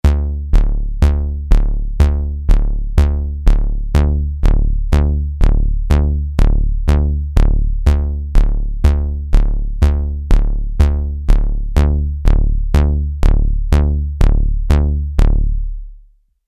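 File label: bass, Juno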